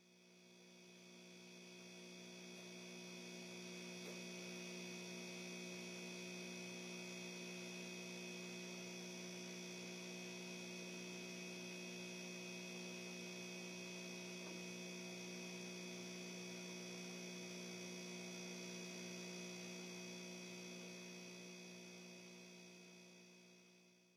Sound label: hum; Minecraft; liminal-industries; Liminal Resources